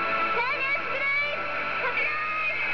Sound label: silence